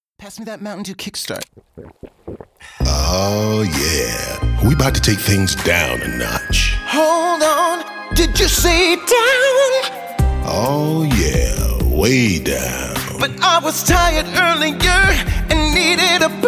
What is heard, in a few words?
Slow Jam; Mountain Dew Kickstart